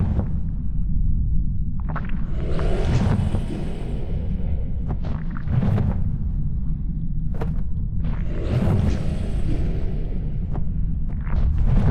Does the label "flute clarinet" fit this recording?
No